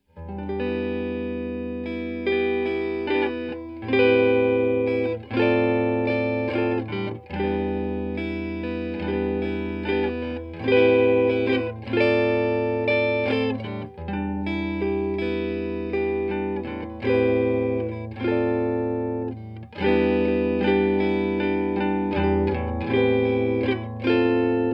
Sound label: Deluxe Reverb with Internal Reverend Alltone 1250